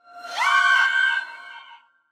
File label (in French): Banshee Cri; Phasmophobia